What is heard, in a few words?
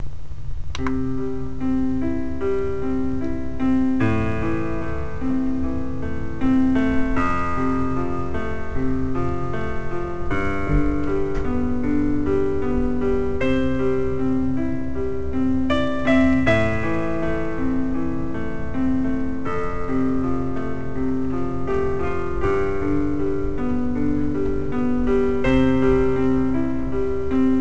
Instrumental; Dryad